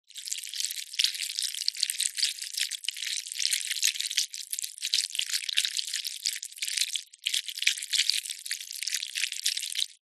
nature bacteria organisms movement wriggle close squelch; zapsplat